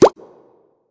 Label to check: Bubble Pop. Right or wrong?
Right